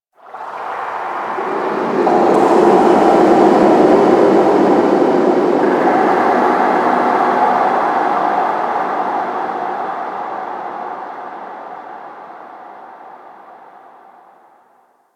Ocean Underwater Texture Medium; Ambience; Warframe; Grineer Ocean

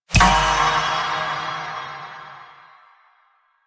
Naginata Dice Individual Buff; Weapons; Melee; Warframe; Koumei Naginata

